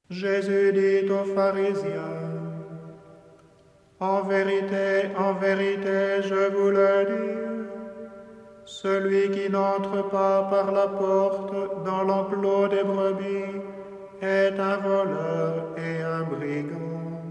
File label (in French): Antienne Amen Amen, canti